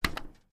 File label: switch